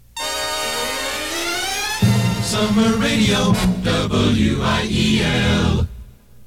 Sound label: jingle